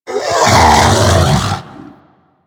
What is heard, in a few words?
Predator Vocal Captured Idle; Warframe; Hunting Critters